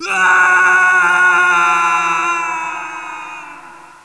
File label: fall; dactylsp